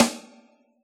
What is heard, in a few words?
open rim shot, Yamaha DJX Rock Kit; 144 Rock Kit